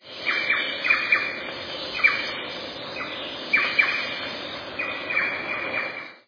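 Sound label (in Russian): оглушительный свист сойки в чаще леса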